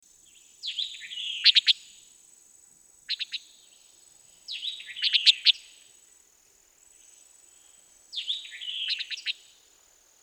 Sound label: antillean nighthawk chordeiles gundlachii, Abaco Birds; Andrew Spencer